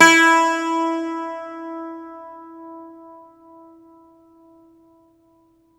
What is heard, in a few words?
Irish Bazouki; E-MU Producer Series Vol. 7 – Old World Instruments